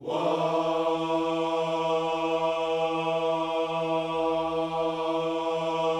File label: WHOA, Gospel; Spectrasonics Vocal Planet